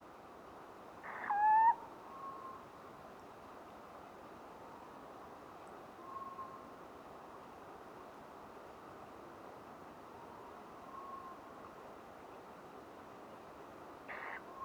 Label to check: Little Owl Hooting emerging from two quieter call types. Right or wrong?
Right